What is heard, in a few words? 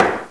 plop